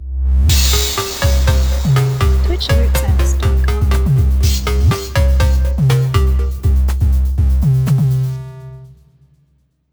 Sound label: Trap Host